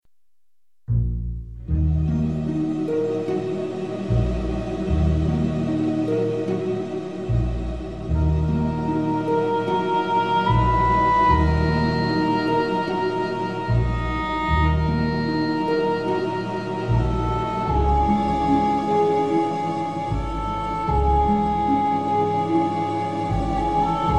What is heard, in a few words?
e horn